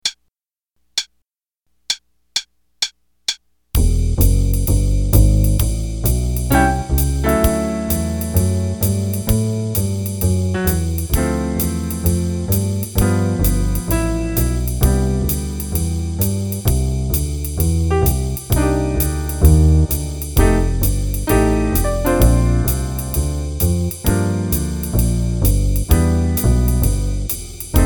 Play a long 4 choruses qn=130, Jazz Improv Play-a-Long; for soloing